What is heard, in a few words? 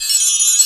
DG WAVE, DIGI SYN